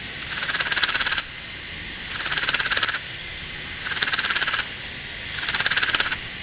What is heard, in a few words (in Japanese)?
yabukiri; zukan